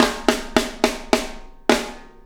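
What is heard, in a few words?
CAT FILL right, CAT FIGHT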